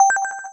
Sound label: FX BEEP; PC Gamer 49